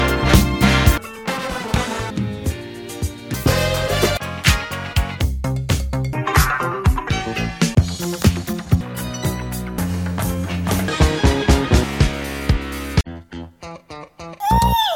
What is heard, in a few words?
disco